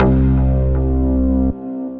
Bass Rack; Oxi; Ableton; Skitter and Step

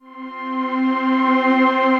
Slowly Evolving Pad; Ethereal Pads; Ableton; Mood Reel

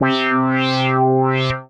Koolaid Truck, Synth Keys; Ableton; Creative Extensions; Poli

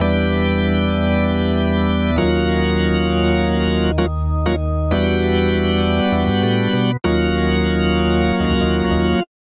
Vamp Gospel Organ Sideslip E Minor 98 bpm; Electric Keyboards; Ableton; Factory Packs

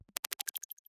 Zizzle Echo, Drum Reverbs, IR Samples; Convolution; NI Kontakt 5

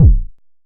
analog deep, kick; Ableton Foundation FreePacks

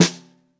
SN Bottom, Snare

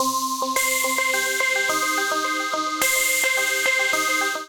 Marimba C Minor 73 bpm; MIDI Clips; Melodic; Dilla; Ableton; Factory Packs; Chop and Swing

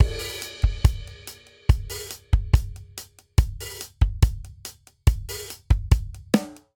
Syncopated Folk Intro 142 bpm; MIDI Clips; Ableton; Factory Packs; Drum Booth

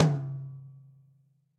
Tom High Classic Muffle; Drums; Drum Hits; Ableton; Session Drums Studio; MPE

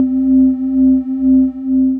Sinenoise Notes, Broken Keys; Instruments; Ableton; Factory Packs; Mood Reel

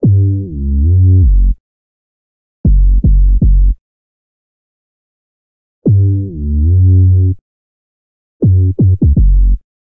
F# Minor 83 bpm, Bassline; MIDI Clips; Tonal; New Old School; Ableton; Factory Packs; Chop and Swing

